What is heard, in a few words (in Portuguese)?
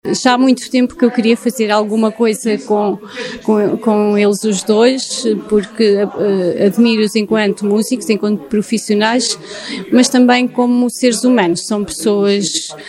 CORO